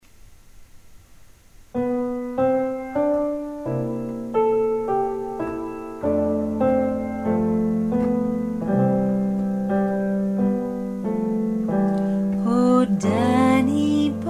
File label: alto; danny boy